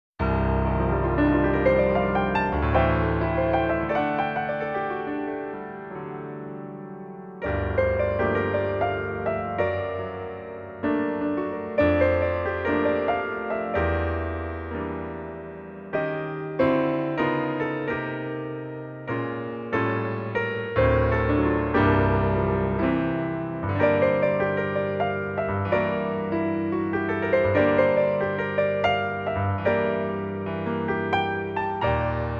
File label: Piano Ver.; Eiyuu Densetsu - Sora no Kiseki Original Soundtrack